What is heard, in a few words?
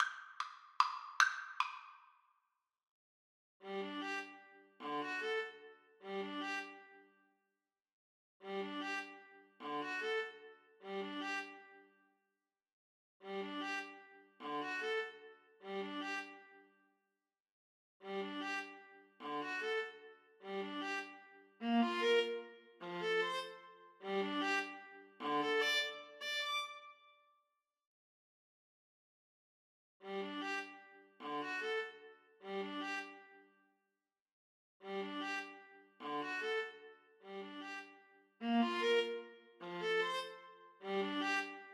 Viola Duet